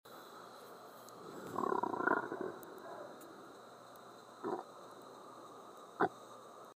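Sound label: Gurgling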